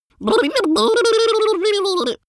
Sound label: lip smacking child long; vocals; comedy-cartoon; soundpool